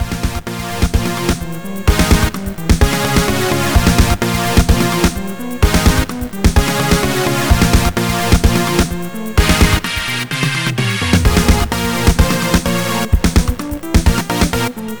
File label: 8 Bar Song; Reason